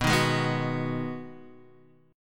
B 6th B down, chord; strummed

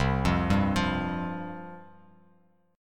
C Suspended 4th C, Bass Standard; Csus4 arpeggiated; JGuitar

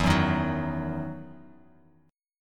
E Major 7th Suspended 2nd E down Bass Standard; EM7sus2 strummed

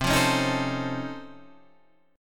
Db Augmented Major 9th, C

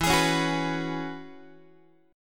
E 6th Add 9th E, Guitar; strummed; chord; x,12,11,11,10,10

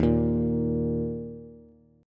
Eb 5th, Bass; Eb5 strummed; JGuitar

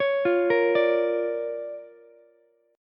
D-Minor Major 7th Sharp 5th-D-down-Ukulele; DmM7#5 arpeggiated; JGuitar

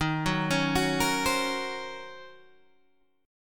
D sharp Minor 13th D sharp, chord

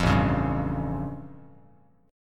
A Augmented 7th A Bass Standard

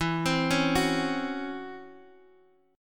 Db Minor Major 7th Double Flat 5th E

